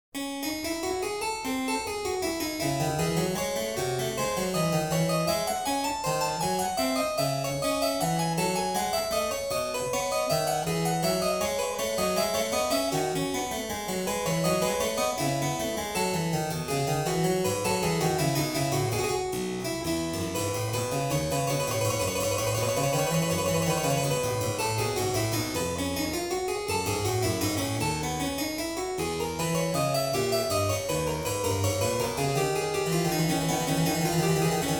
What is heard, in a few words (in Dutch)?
inventionen voor klavier in d klein; johann sebastian bach; nr 4